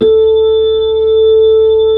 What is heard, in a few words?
B-3, Hollow; Roland LCDP10 Keys of the 60s and 70s 2; Hollow Xfade